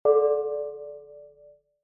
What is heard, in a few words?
tone